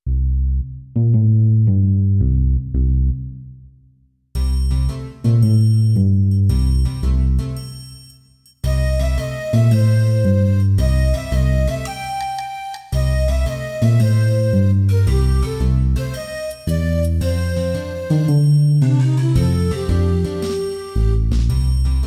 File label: pop n blues